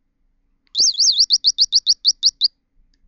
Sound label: Bird sound